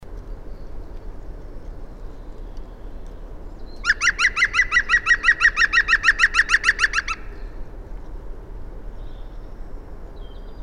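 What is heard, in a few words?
zelenyy dyatel golos, green woodpecker voice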